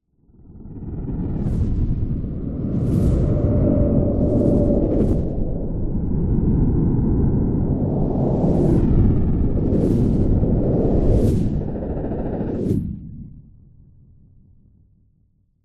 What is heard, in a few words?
science fiction spacecraft squadron fly past